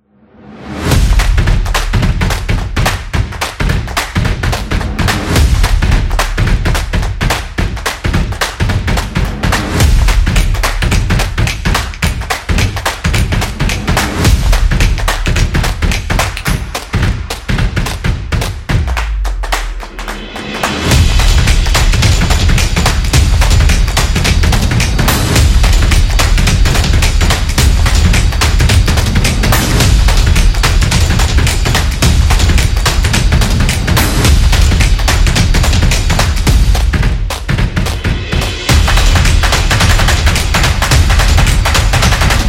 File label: Stomp Clap Slap